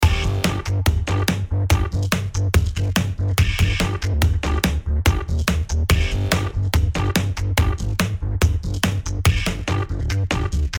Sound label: Multiband Ozone3